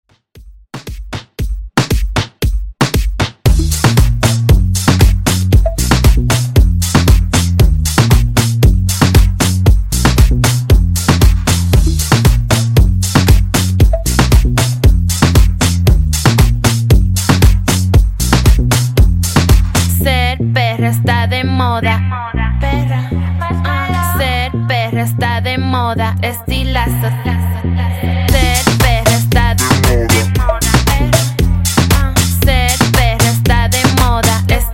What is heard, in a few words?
116 Bpm; Dj Intro Outro